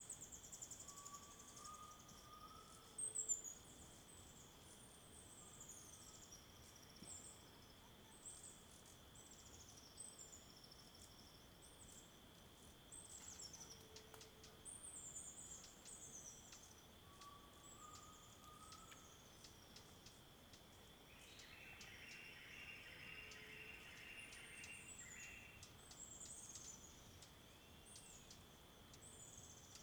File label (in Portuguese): Ambiencia beira do rio calmo a tarde com muitos passaros e alguns estalos; de madeira